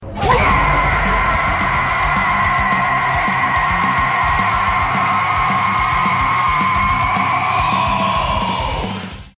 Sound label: rage screaming; aggretsuko